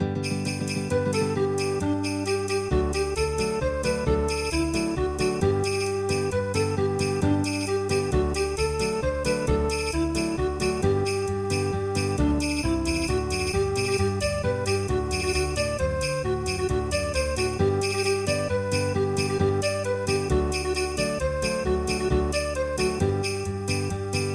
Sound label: flamenco